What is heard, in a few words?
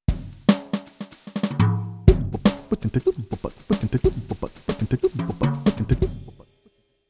spd 20 brushkit